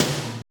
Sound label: SEA TOM MD; POP-DNC 2